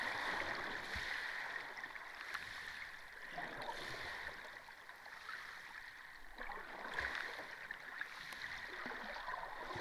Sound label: hydrophone; whale Watch